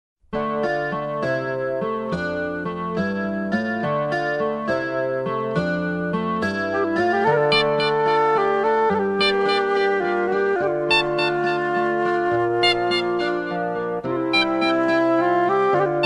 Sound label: Melody Nice